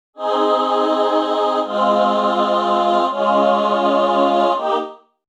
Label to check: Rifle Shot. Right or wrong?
Wrong